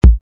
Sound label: kick